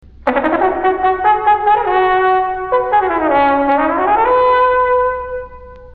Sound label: Mellophonium